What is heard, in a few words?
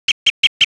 crossbills toops